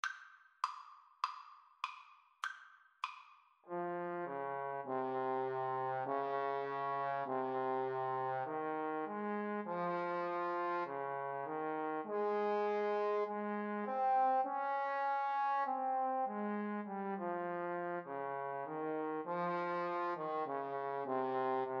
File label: mountain thyme, Trombone Duet